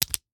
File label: Slap bracelet, smack, slap, whip, felt, plastic, hit, roll up; Eiravaein Works; Vaeyan IV